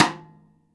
Kit Rim, Drums Acoustic; USB Soundscan vol.10; AKAI; 90s sample CD

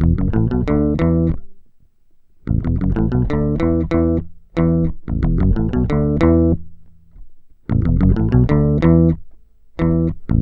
grungy, Bass Grooves; USB Soundscan vol.30; AKAI

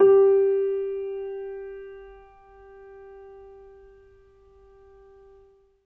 MP32 L, Acoustic Piano; InVision Interactive; Miroslav Vitous

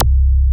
MOOG C1, ANALOG BASS; Music Store - Claudius Diemeter Schwerlos

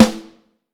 Vintage Snare Drum

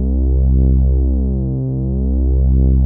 ANALOG PAD C2; Music Store - Claudius Diemeter Schwerlos

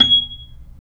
B-3 Percussion; Roland; Keyboards of The 60's and 70's

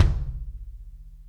kick

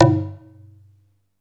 PERC G1; SEQ AND PERC; Music Store - Claudius Diemeter Schwerlos

